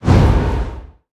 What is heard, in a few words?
roll intense; smite; god geb skin7